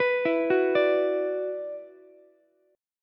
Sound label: Gb 7th Suspended 4th Sharp 5th Gb down Ukulele; arpeggiated; JGuitar